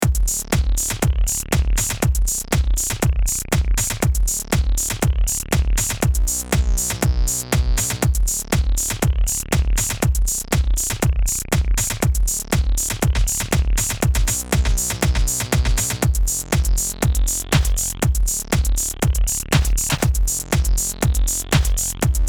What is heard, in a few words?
Electro Studio